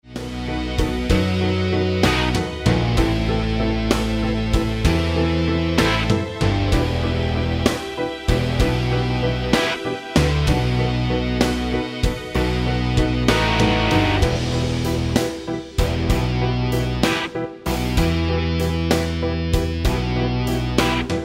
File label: 12 Bar Blues Backing Track in Em; Lonely Blues